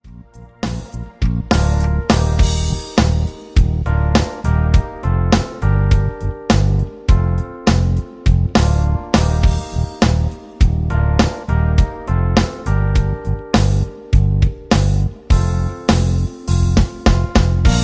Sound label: rhythm